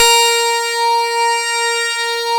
A#, PIANO; Big Fish Audio; Synclav FM

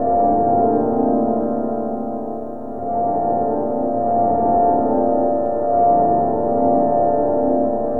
Drone Ambient; Drums & Drones

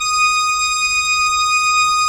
D#6, CHURCH OR; Best Service; Advanced Media Trax Modern Composer

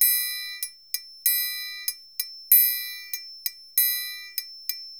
PERCUSSION LOOPS; Sampleheads; NYC Percussionworks; 90 s Sample CDs

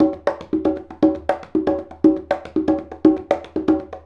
Congas; Essential Percussion

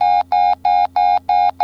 PHONE; AKAI S-Series CD-ROM Sound Library VOL-6

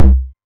808 Kick; Novation DrumstationV1

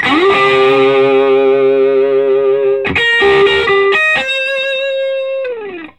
SOLO, Vintage Blues Guitar

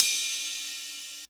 HH, Dance Mega Drums; Best Service; HH-HIP

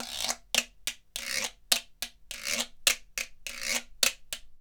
GUIRO; ILIO; Synclavier Percussion 2; World & Orchestral

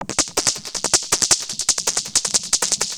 Vocobeat; Sonic Foundry (Sony Creative Software)

